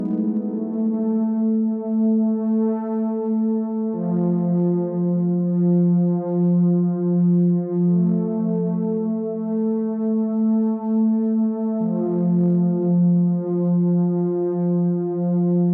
122 BPM Pads A maj G min Tonic A, ambient synth pads; MMB